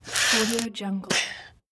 a smoking a cigarette

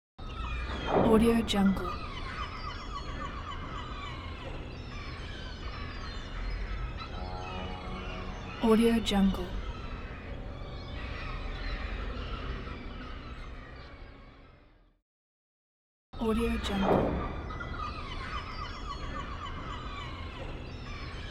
sea port ambience

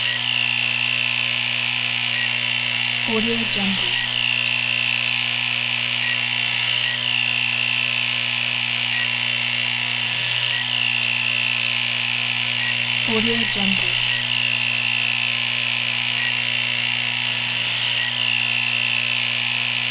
alien razor; prosoundfx

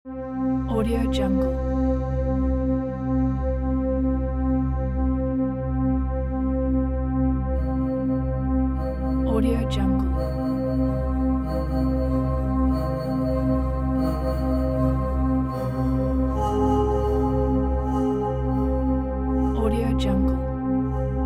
thriller film background ambience

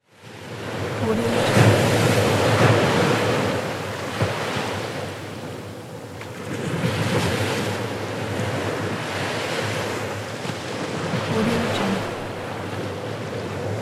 waves

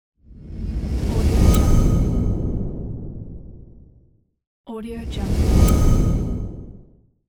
woosh

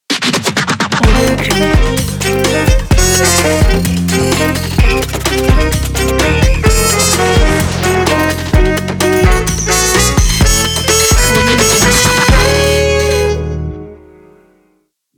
catchy podcast logo

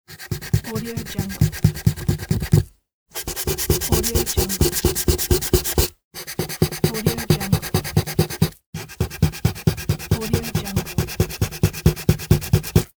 small rubber eraser

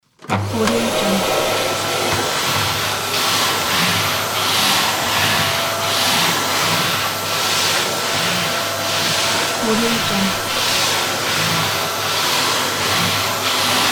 vacuum cleaner; soundfxgarden

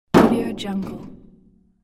thick metal plate medium hit

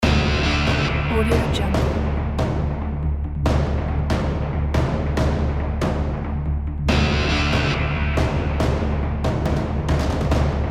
cinematic epic trailer

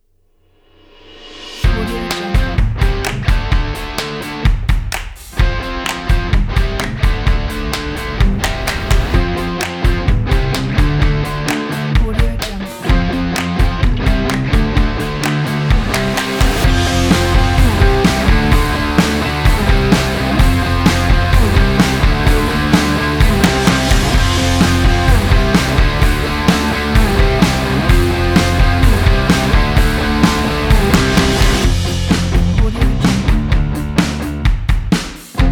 indie rock garage

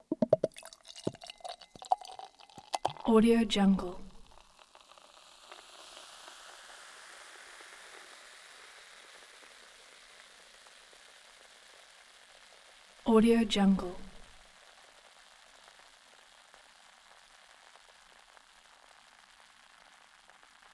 beer pour into glass; l schoeps; r neumann

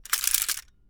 gun mechanism strong; soundmorph

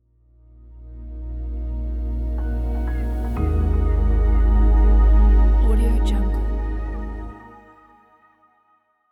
nature ident

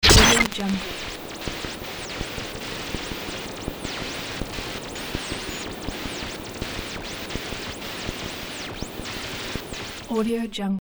off air; sound-ideas

